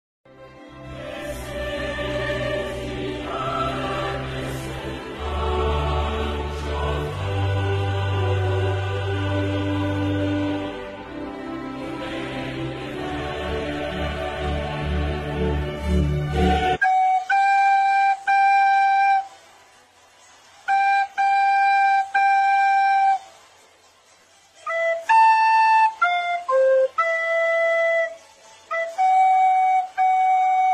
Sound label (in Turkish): Flut Versiyon